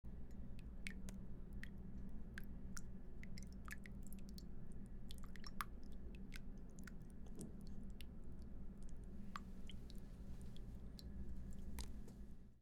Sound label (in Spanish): Goteo de Agua